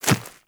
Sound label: Small Walk Dirt, Footsteps; Warframe